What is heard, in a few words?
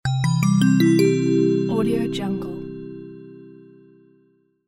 reveal positive; medusamusiclab